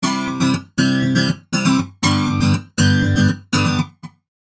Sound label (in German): Flanger Gitarre